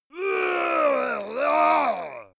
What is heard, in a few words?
monster male growl aggressive; kreaturen